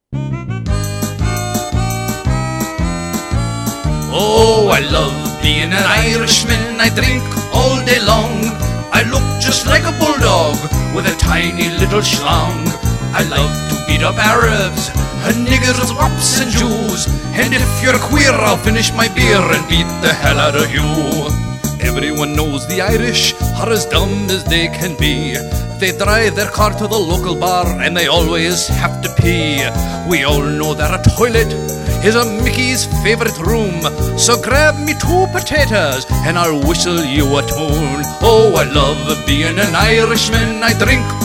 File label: St Patricks day irish melody